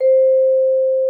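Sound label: vibraphone C4; Xsample VOL-4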